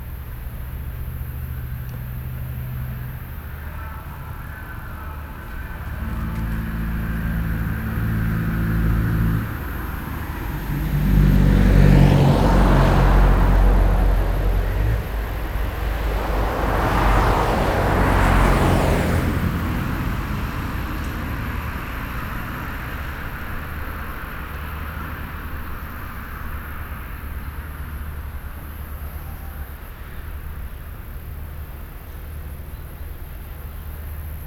kanyarban talalkoznak szallastol keletre, thassos

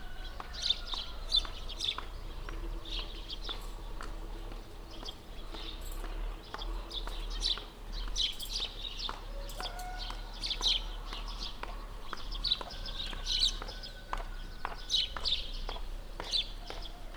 kopogó női, verebektől hangos utca, távolban egy traktor; szolosardo

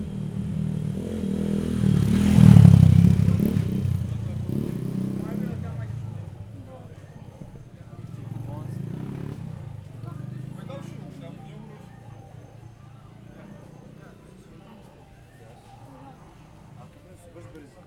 robogó jobbról balra; thassos 2014

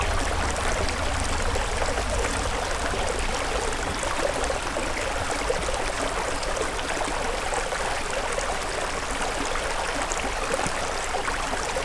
szép hangú, patak; visegrad

